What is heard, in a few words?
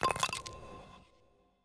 dark crystal obtaiment